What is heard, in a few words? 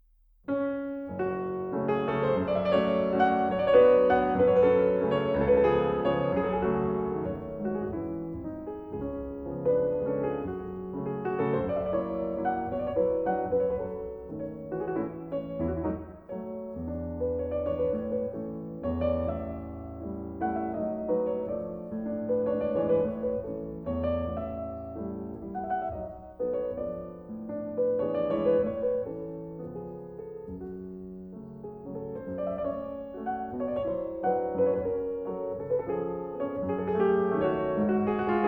Chopin Mazurka opus 59 no. 3